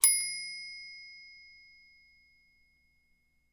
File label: Typewriter Margin; typewriters